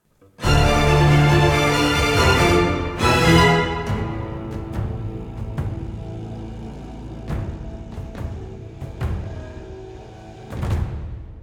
Music Battle

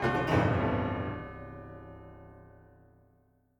Piano Smash Notes; The Town Intro Sequence 03; Devour

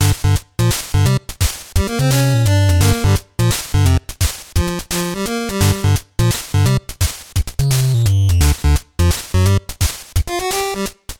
8bit Garage; gamerip; Hill Climb Racing; Android

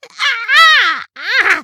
stagger; devour 2021 gamerip pc macos

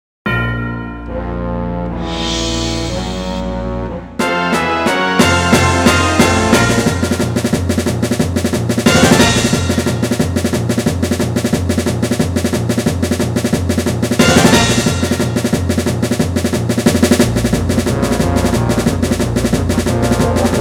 music quest battle

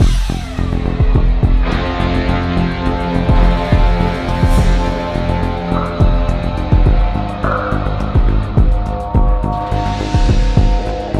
Lose; Yu-Gi-Oh! GX Tag Force; 2006 psp

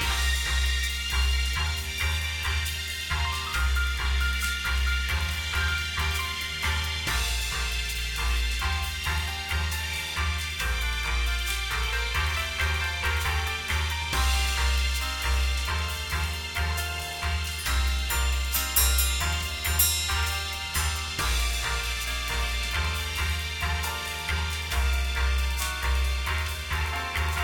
Elevator Lobby Music

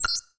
Ui Button Ok Click; pangya fantasy golf pc

